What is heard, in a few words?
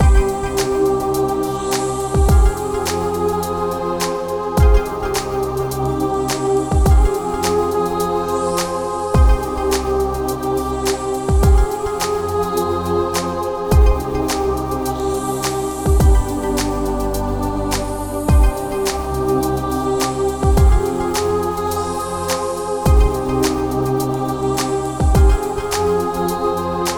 Mystical Beings Calling, fantasy